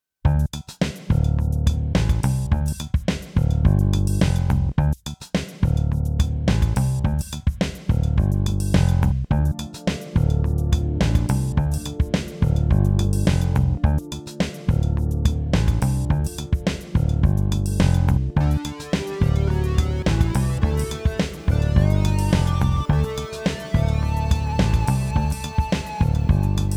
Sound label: guitar; Spectralis SampleLibrary 2